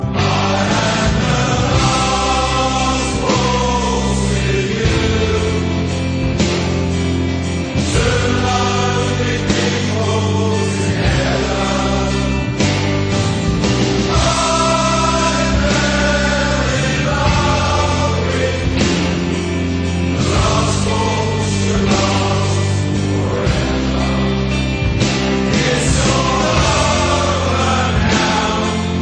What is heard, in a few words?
Waltz